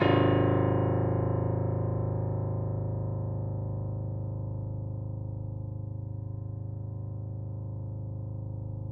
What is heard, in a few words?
piano; East West; The Ultimate Piano Collection